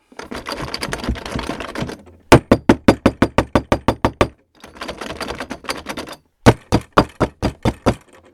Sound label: Knocking On Door Rattle Knob